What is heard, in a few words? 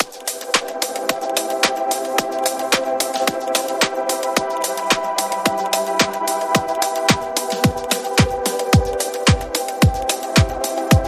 Alpine Ambience Rhythm